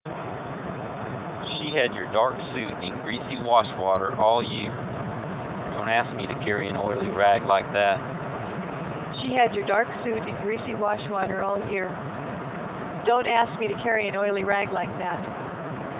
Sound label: car, noise